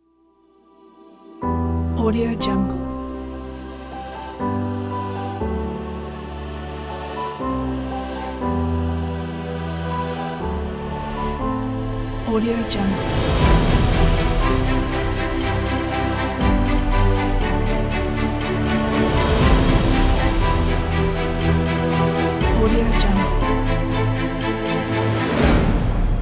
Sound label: epic cinematic is epic cinematic; audio-atomy